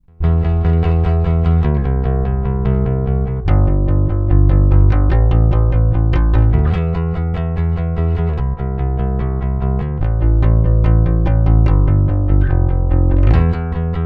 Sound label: Bass boost